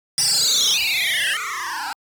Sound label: Fireball Hitting; Dragon Buster